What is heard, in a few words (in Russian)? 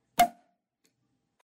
otkrytie butylki s bugelnoj probkoj